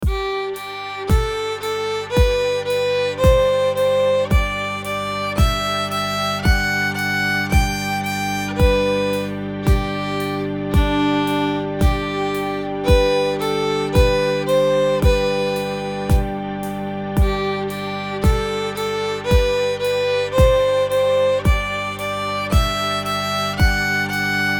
practice loops fly away scale beat

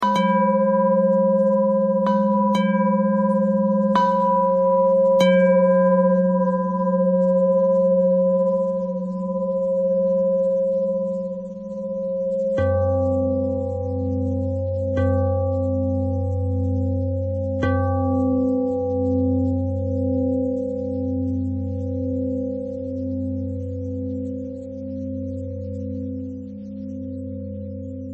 bell thread pentatonic

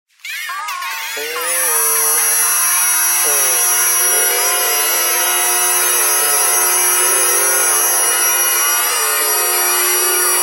bagpipe geese thread noise

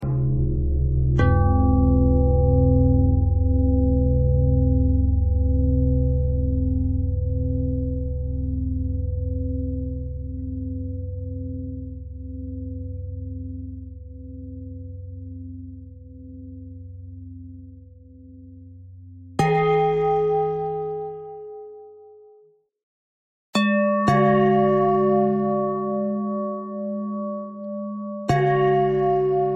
bell thread pentatonic

